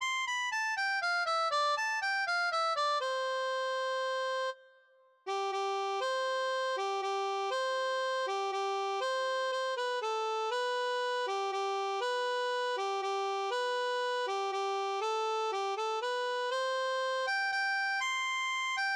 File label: melodica